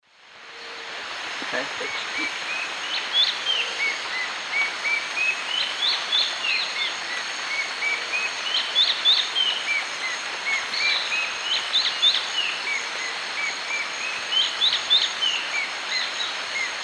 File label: Myrmotherula multostriata, slow song; S. America; Eastern Lowlands